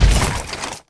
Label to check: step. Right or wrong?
Right